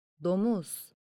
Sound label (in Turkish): Domuz